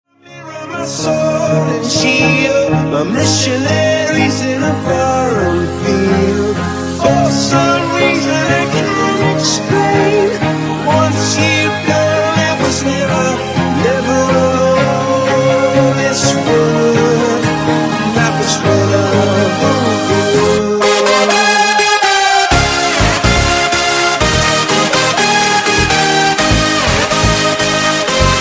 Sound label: Funky Remix 124Bpm